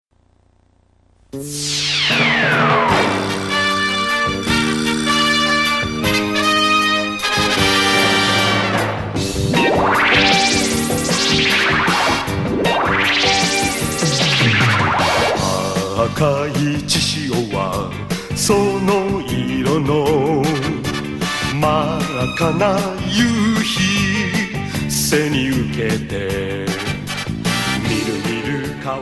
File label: Robot Intro; Gordian